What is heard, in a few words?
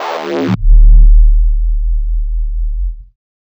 Wow Over Bass, Drum n Bass; KJ Sawka; Drum n Bass & Beyond